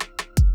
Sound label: Minimal Fill, Drums; BB 2HTC Samples Vol 4